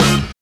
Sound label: HIT STAB; AMG - Now CD-ROM (Roland); Skip To My Loop